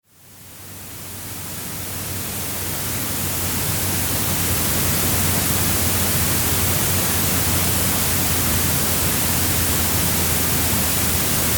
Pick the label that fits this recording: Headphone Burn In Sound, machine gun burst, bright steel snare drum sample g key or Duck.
Headphone Burn In Sound